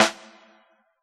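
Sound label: abstract kit snare; Dr 202 Kit 1; Percussion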